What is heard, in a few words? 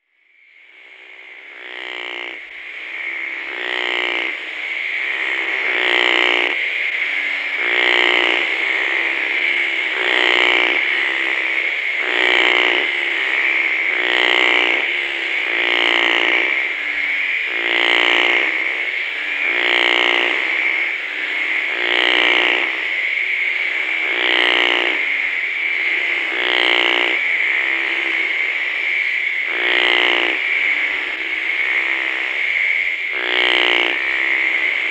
Phrynohyas coriaceus